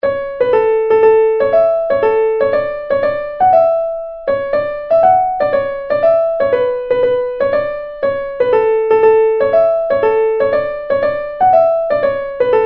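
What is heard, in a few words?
Hornpipe, Traditional Irish Music; McCarthys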